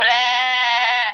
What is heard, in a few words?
attack, goat; sAnimals